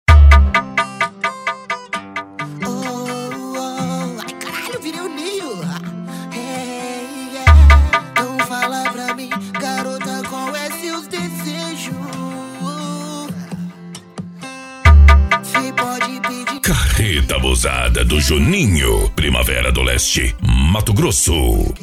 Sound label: mega bass